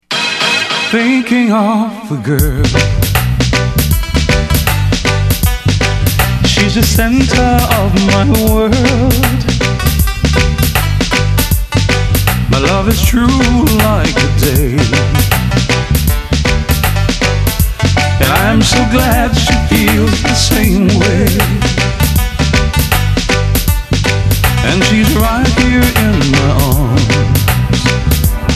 Singer Pianist; Orlando

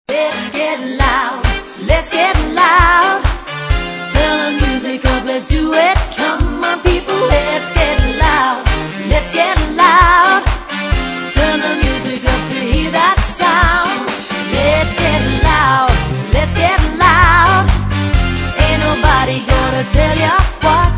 Party Band; Sydney

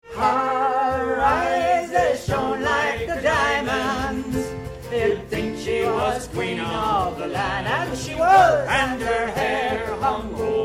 Irish Band; Pittsburgh

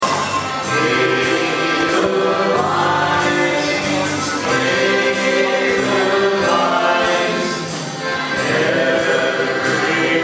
German Band; Orlando

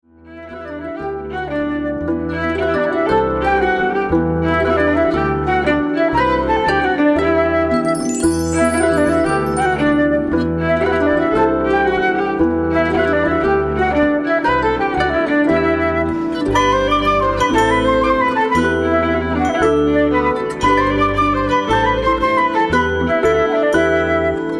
Irish Band Jig; Orlando Irish Band

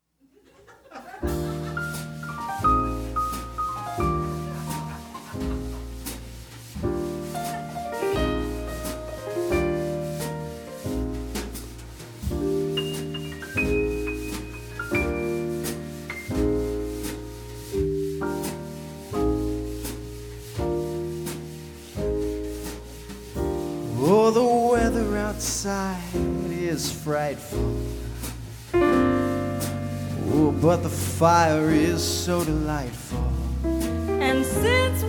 Christmas Trio; Seattle

